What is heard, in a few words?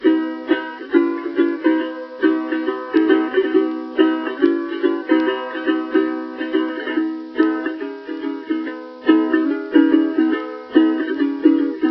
songster tenor